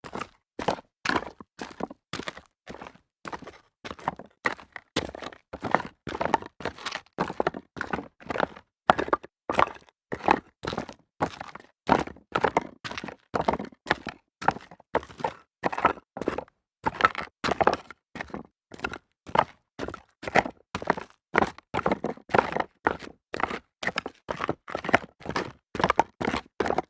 walking fast on rock debris

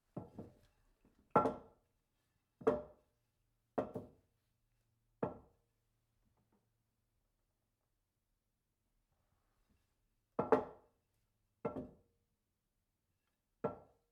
bowl, household

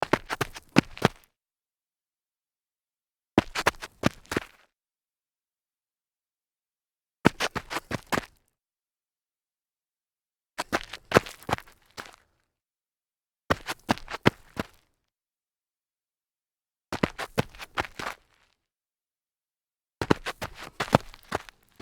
sneaker mount stumble light grit

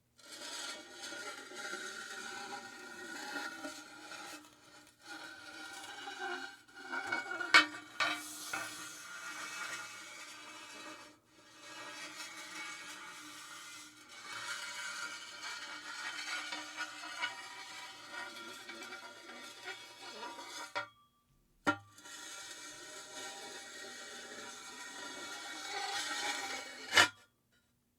fuel rod insert

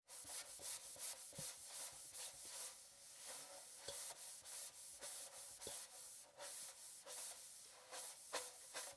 scrubbing metal

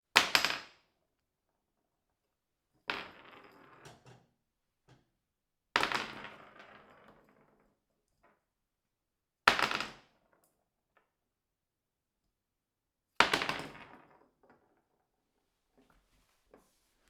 spice jar on tile floor

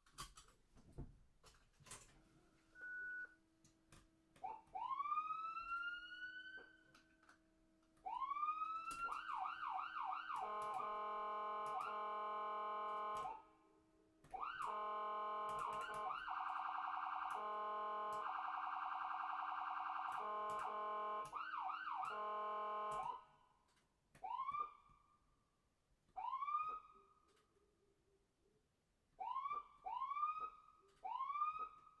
ambulance siren

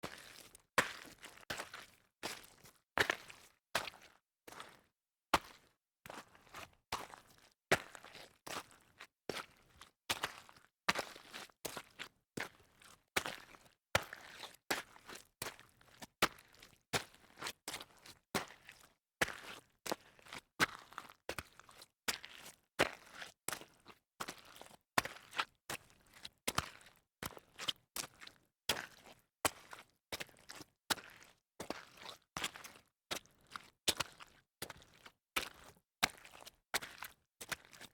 walk on muddy gravel series, foley

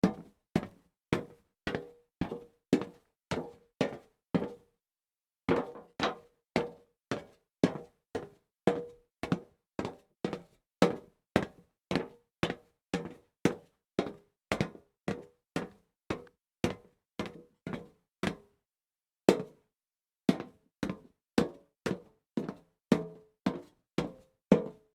walk on hollow wood series, foley

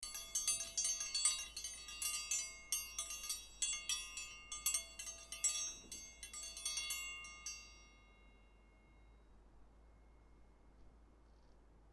dinner bell triangle

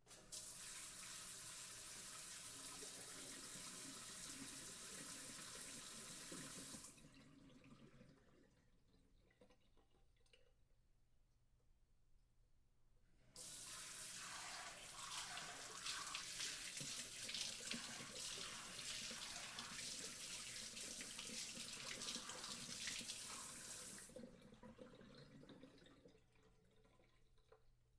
water sprayer

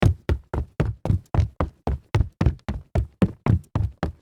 run on wood

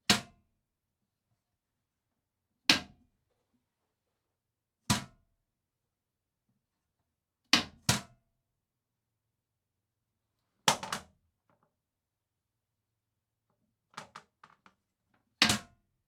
toliet seat closing series

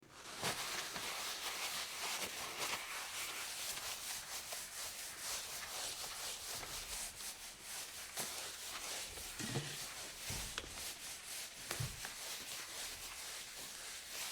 wiping off counter

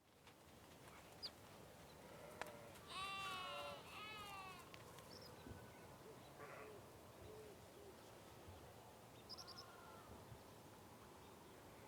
farm morning with sheep

